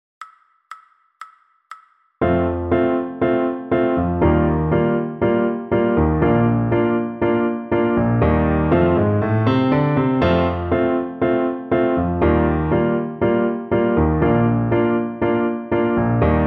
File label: piano